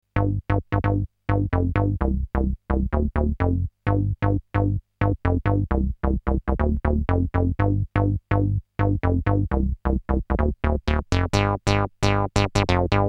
SH 1000 Basses

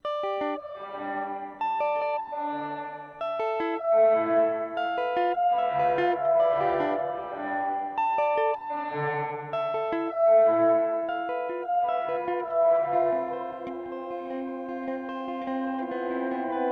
Plate; Walrus Audio R1

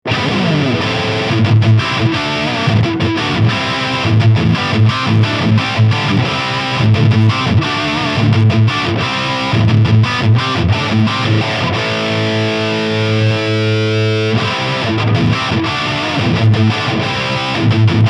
Schecter High Gain Vintage Modern

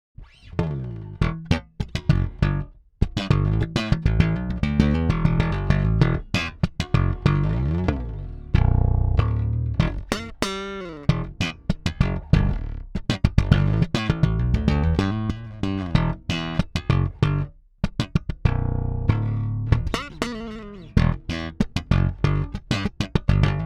Slap

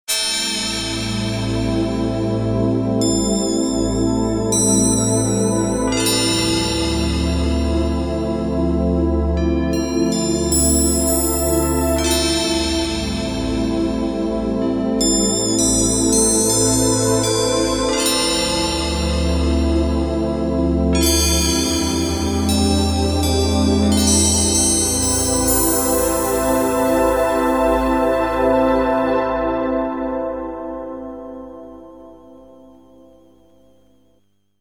Korg T1 Silver Pieces Pad Layer

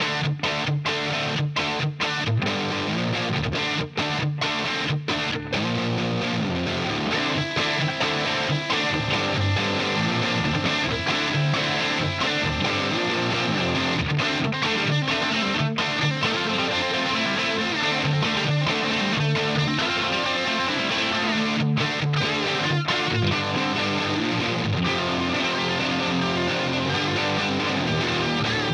Headrush MX5 Plexi

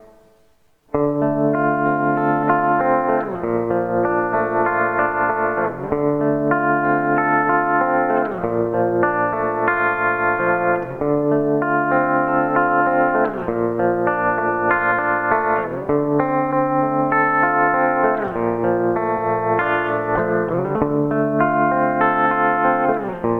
tremolo

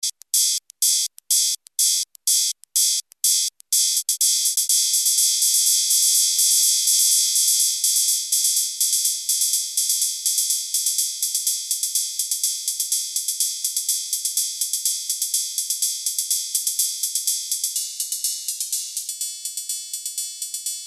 MODOR DR2 HIHAT

